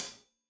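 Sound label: hat closed, hi-hat cymbals stereo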